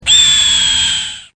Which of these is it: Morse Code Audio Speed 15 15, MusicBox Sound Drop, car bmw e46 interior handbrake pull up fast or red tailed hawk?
red tailed hawk